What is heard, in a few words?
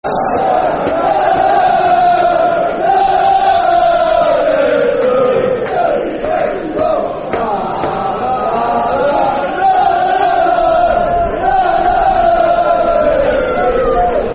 Na Na Na Na Na Na Na Leicester Football Chant (Soccer Songs); Leicester City Lcfc